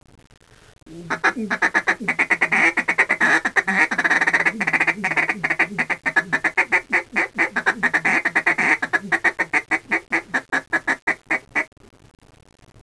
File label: feeding call